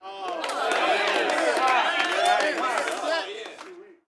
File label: street ball crowd cheer low; Crowds